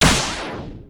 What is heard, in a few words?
Enemy Delete